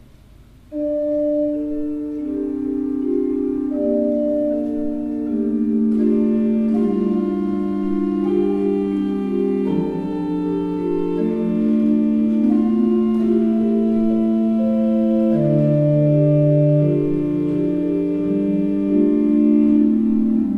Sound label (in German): Fuehrer, gebrauchtorgel